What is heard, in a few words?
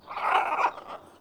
Bird Of Prey Vocal Perched Ground